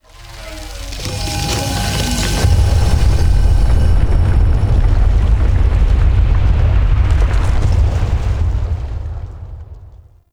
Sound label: Murex Medusa Tower Start; Veilbreaker